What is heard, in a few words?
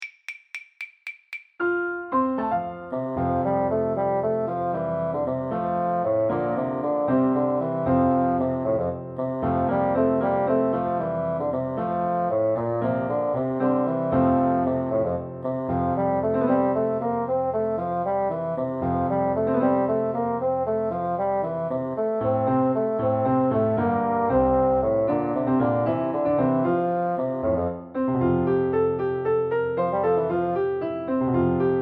the maiden blush piano basson